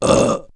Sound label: self attack; weapons; cinderbrew; dota; brewmaster